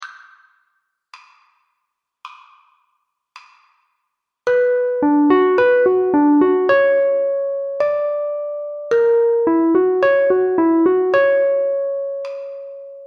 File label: Intro 54bpm